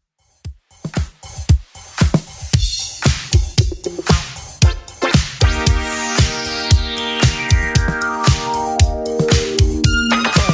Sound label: 80s Club Edit 115bpm